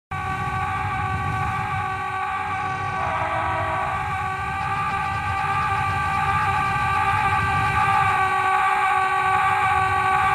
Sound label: aaaa; cave